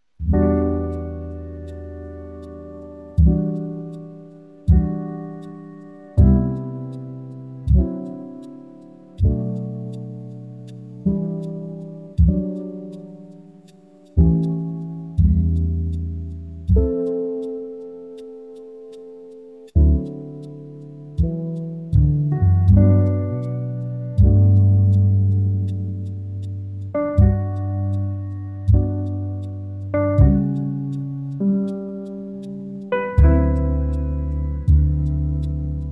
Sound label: the engine hum fades, sleep jazz fireside